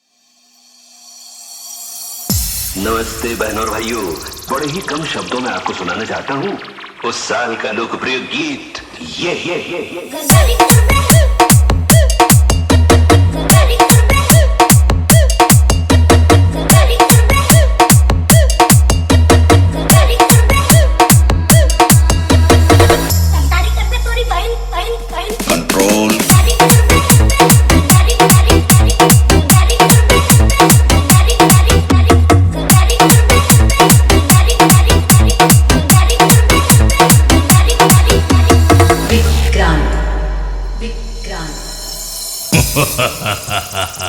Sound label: Vibration Competition Beet; Dj Vikrant Prayagraj